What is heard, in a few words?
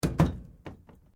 Car van old door close; various vehicle sounds; Cars